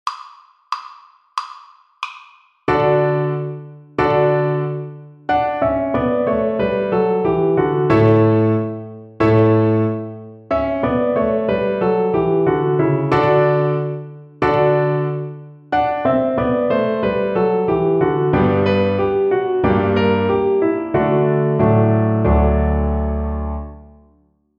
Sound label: String Gym, Piano Accompaniments